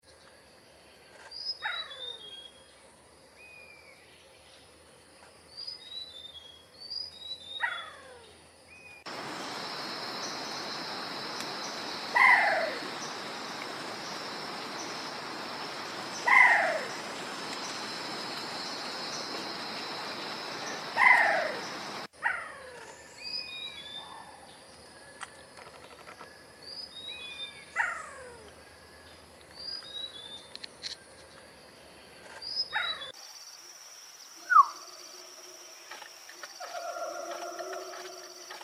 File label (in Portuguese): Canto do javan banded pitta